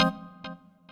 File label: organ